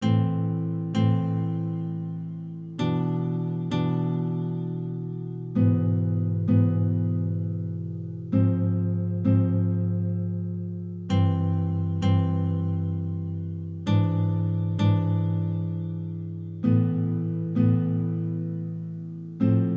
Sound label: Backing Track